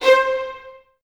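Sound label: Violins C 5 staccato left; Miroslav Vitous; String Ensembles; 11 Violins; 11 VS Stacc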